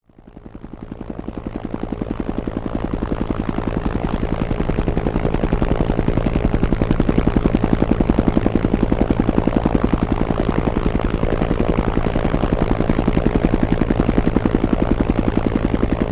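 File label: AH1 Cobra Hover Overhead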